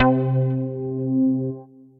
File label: Poly Fab Keys, Synth Keys; Ableton; Factory Packs; Singularities